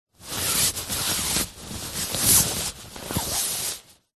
flag handling movement; zapsplat

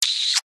Kiss

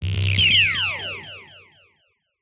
Blasts Synth Delayed Oneshot; sound spark

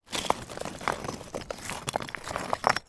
foley broken bricks movement

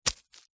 single footstep hard tread on dried leaves

